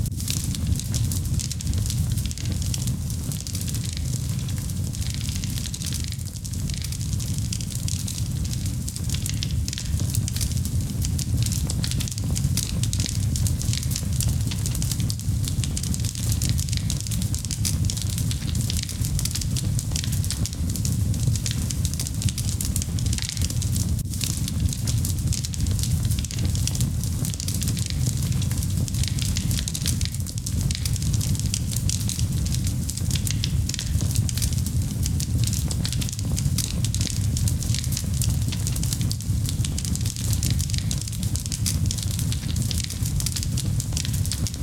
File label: soundscape